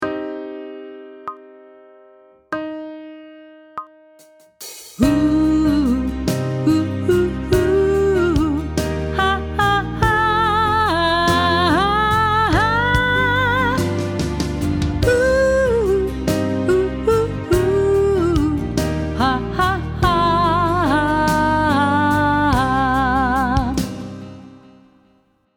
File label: Sight Singing with vocal, pop vocals; Grade 5